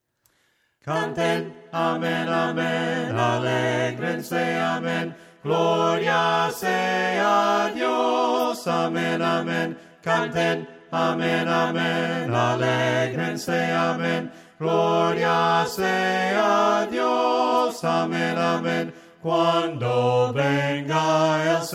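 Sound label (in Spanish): CANTEN AMEN AMEN VOCES, himnos a capella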